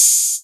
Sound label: 808 Hat O; Novation Drumstation